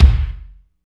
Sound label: KICK, Tekno Industrial; East West